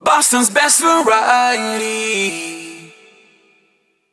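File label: 124bpm MIX, jingle; WBMX 2017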